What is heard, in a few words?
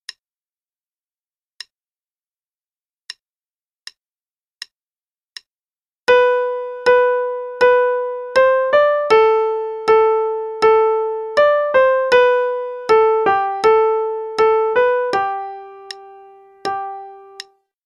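Play-a-long, March, right hand, quarter note=80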